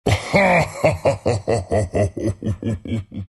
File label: treant laugh; dota